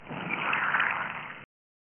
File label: Move (Swamp Slime - Water Elemental); Dungeon Master and Chaos Strikes Back; Amiga